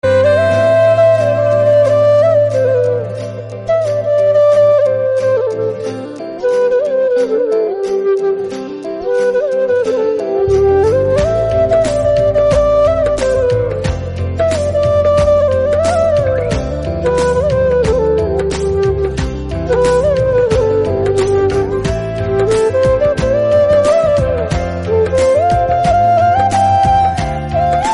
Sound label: Instrumental Flute